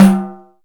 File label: percussion, Ethnic Perc